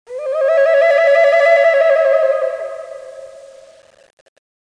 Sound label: Echo Flute, Native America; Echo Flute 9.1; VoicesofNativeAmerica; Sonic Foundry